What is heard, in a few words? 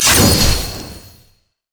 yasuo skin sheath spark; LoL; yasuo skin01